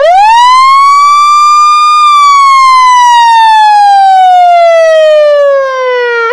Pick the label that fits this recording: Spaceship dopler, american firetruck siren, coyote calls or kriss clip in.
american firetruck siren